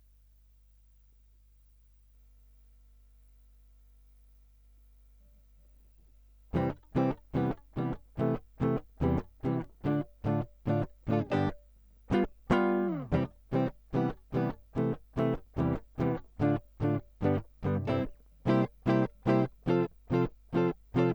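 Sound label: Guitar Line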